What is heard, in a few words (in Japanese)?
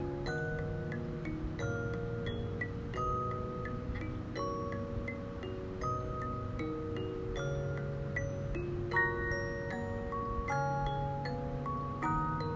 オルゴール